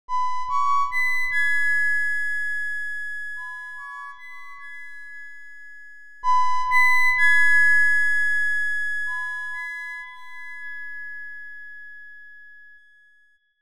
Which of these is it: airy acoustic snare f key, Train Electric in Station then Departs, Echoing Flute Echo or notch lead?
Echoing Flute Echo